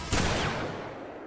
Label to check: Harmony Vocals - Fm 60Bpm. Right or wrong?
Wrong